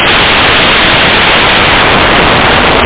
tom drum

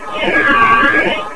Cloak; Rescue! 1.6

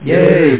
yay; downhill

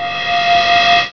Synth-motor; Vitamin

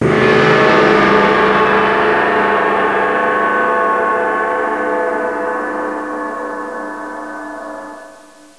GONG; PC Gamer IT CD 38; thief

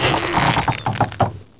car Stop; SERennen

